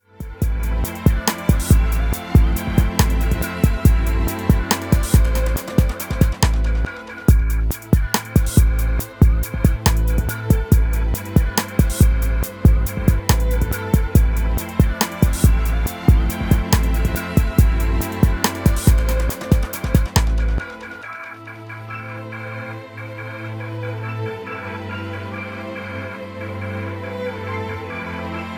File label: Violins; Premaster + Master